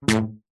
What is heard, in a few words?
rubber band ping hard; foley